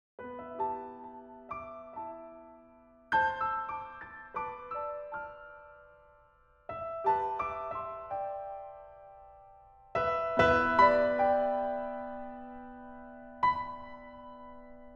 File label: Improv Ivory